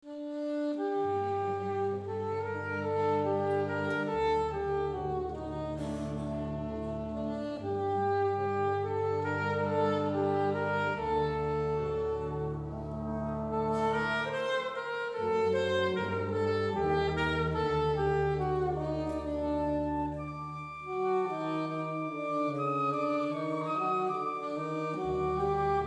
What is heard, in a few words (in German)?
Konzert Israeli Folk XY Stereo Hall